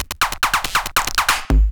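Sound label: DRUMLOOP ELECTRO 140; Techno2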